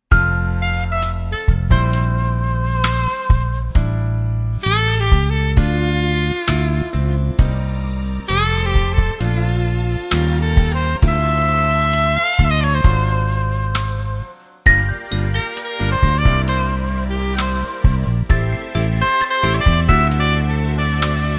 Sax Life

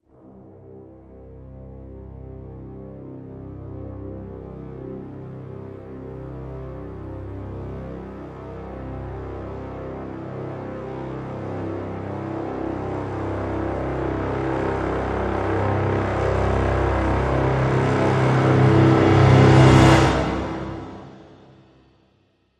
CRES Brass Swell Lo (C)

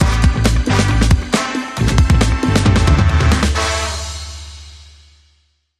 Bumper

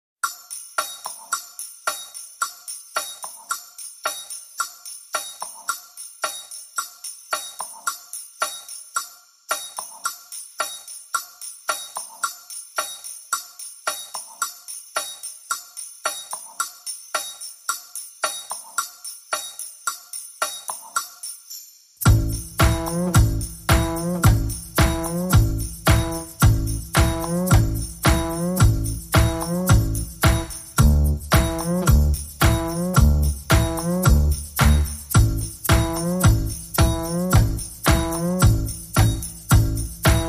Drums and Bass

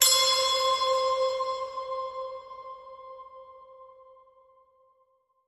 Magic Wishing Bell

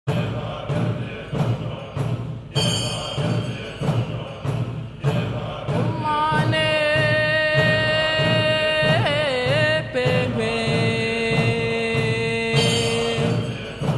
Tibet Monks

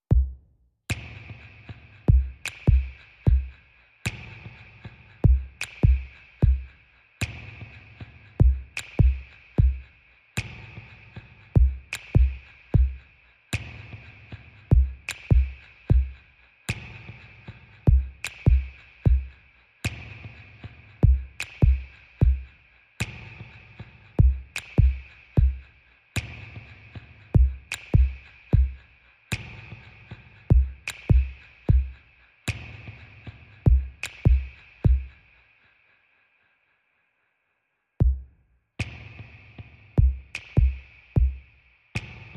Rhythm Only

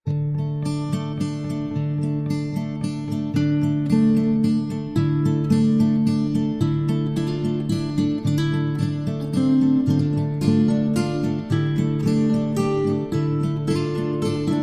Guitars and Percussion; 60sec

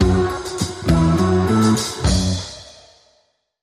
Clean Sting

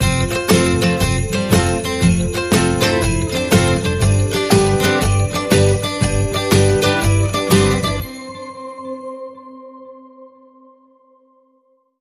Happy Christmas Ukulele, Sting

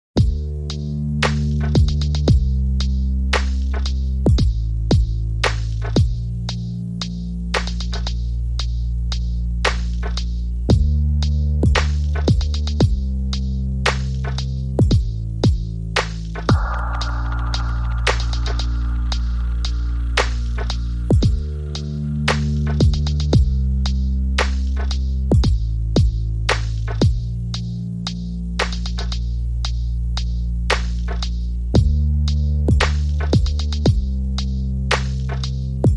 Drums and Bass

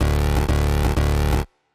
Sting

